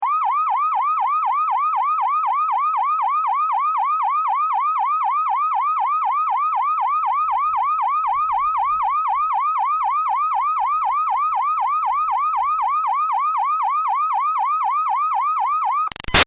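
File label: Siren Police Whooper Steady Close Up; Emergency Vehicles; BigRoomSound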